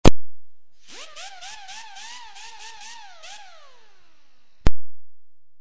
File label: grinder